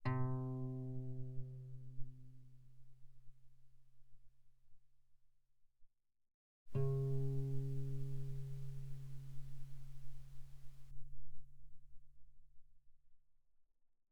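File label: Guitar pianissimo sul E C3 B3; mono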